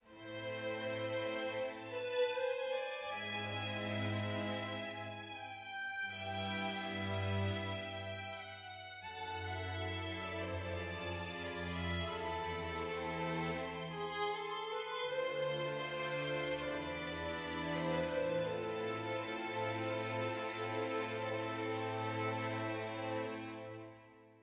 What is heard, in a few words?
sad med; Quivr; PS4 Windows gamerip 2016